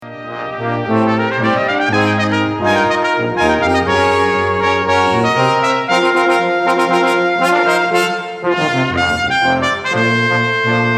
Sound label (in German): BRASSQUINTETT